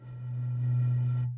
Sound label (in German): pusten in Rohr kurz; Pusten in ein Rohr kurz; Geräusche zu den Klangexperimenten